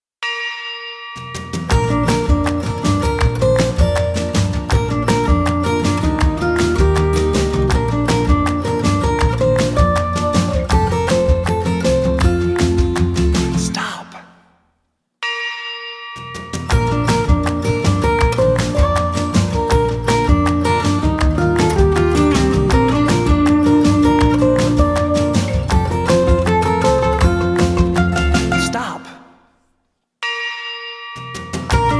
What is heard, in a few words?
Ring the Bell Instrumental